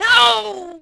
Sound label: fall; Splashdown; XBOX